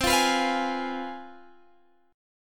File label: C Major 7th Suspended 4th Sharp 5th, C, chord